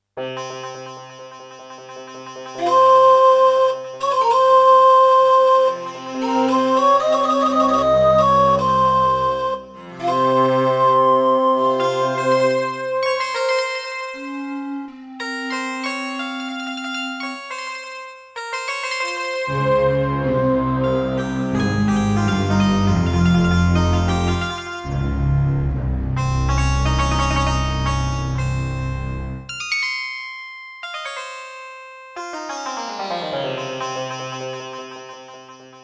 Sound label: Roland SC-88; Prince of Persia 2